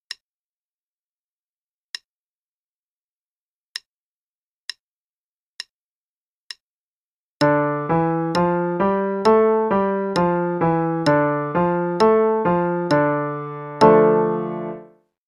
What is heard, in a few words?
d minor Pattern (qn=66); CM Level Prep